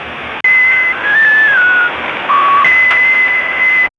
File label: crackling radio static fa; mayday